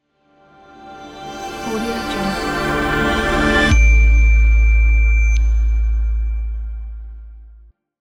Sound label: rising logo